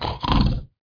boar attack; hero cronan

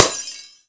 Light Bulb

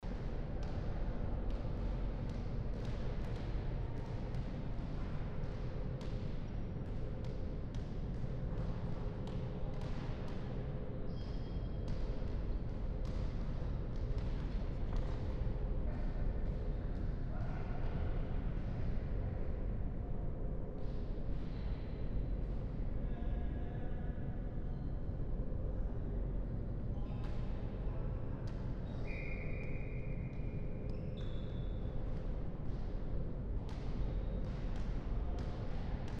Gym Ambience

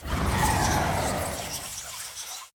mage summon projectiles

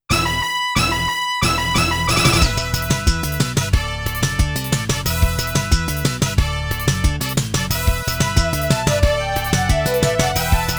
Stage 2 (SC-88); Hisyou

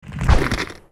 sfx Earth Ward; lego ninjago rise of the snakes

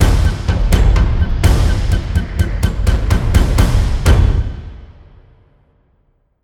sounds music; Halo Combat Evolved; Xbox

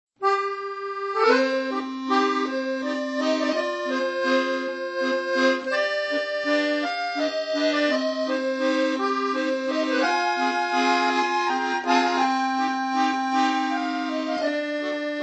BGM Parisian; Shop Empire 3

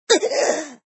Fem Death; Extra Lives

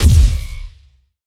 Sci-Fi Weapons-Assault Rifle-Single Shot

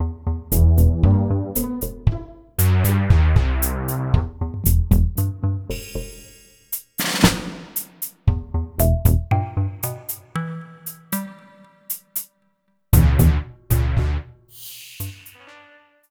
Downbeat; Plants vs. Zombies Battle for Neighborville

